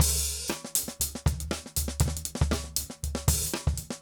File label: straight busy; odd loops